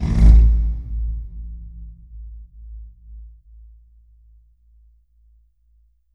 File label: Pipe Rub; Effects